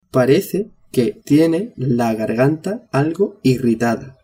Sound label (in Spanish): garganta irritada